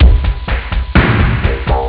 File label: beat sample; Amiga Soundtracker Sample Packs